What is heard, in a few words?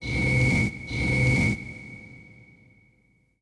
SPACE FX 140; SpaceSounds